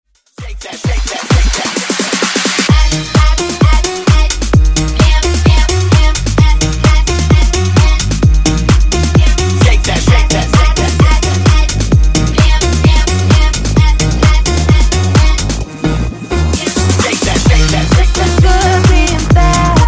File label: Funk Bootleg 128Bpm